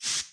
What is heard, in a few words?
spray